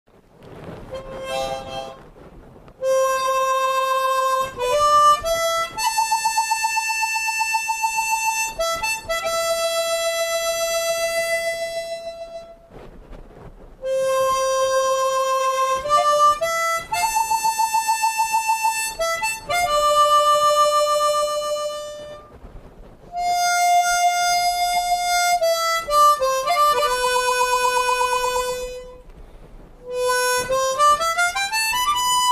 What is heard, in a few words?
Harmonica song; Sholay